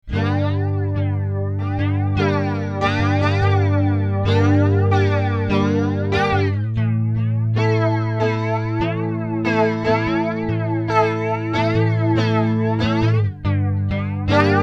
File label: Flanger; instrument + OCTO-FX + audio interface; F1020; flange color; flange rate; flange sweep